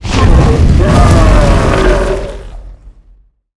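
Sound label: boss attack, monster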